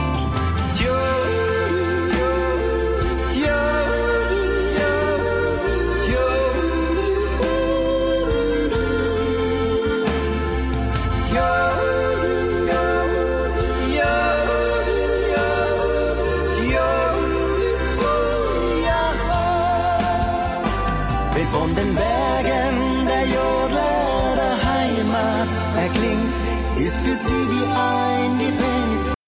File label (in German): Jodler